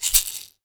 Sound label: Pepper Shaker, household rhythm samples Hits